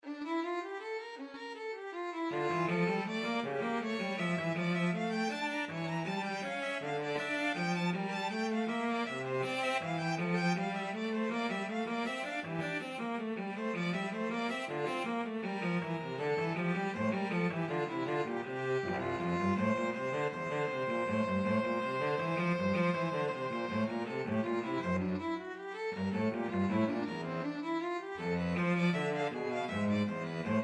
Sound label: violin cello duet